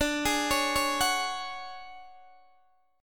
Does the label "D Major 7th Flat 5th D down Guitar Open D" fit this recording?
Yes